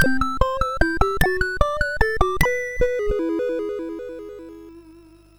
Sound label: sid MOS6581R3